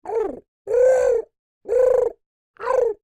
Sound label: Creature Oodle Bird Calls Reacting; Birds; Animals